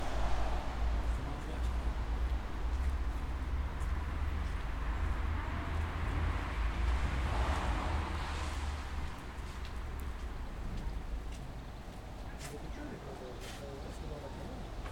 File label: Ambiance Traffic People On The Street